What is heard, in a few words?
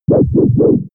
modulated wobble; bewegung; comedy-cartoon; soundpool